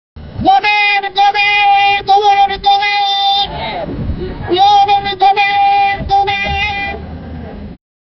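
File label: indian punjabi dhol bhangra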